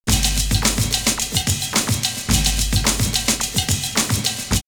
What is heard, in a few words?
Kaotic Jazz 108, drumloops, Rave; X-Static Goldmine; 90 s Sample CDs